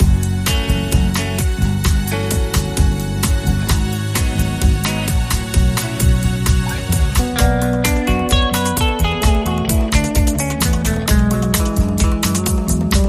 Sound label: Street Bossa